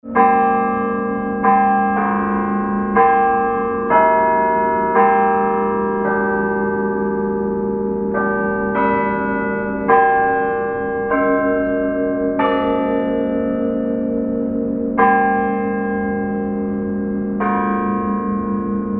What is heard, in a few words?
bells; orton